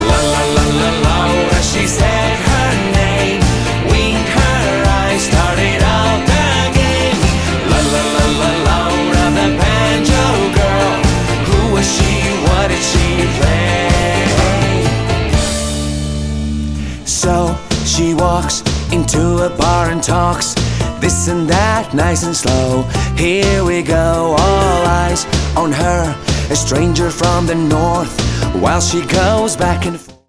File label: banjo